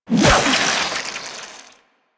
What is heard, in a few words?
Infested Fishing Spear Line Retract; Warframe